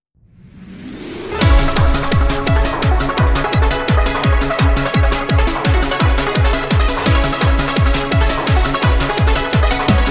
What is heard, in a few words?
Instrumental